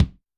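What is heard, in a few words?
bass drum, Kick, Yamaha DTXpress-II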